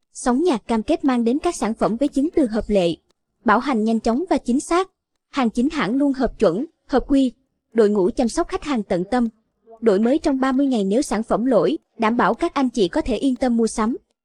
vocals

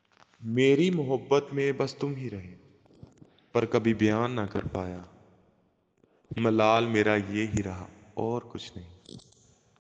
mee slowed and reverb vocals

